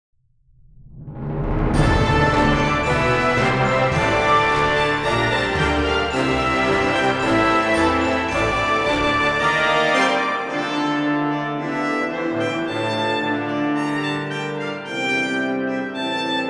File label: victorious neutral, music; Mount&Blade Warband